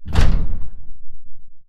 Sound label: footstep generic core; Titanfall 2